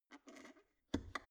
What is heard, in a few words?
plastic water boiler close lid soft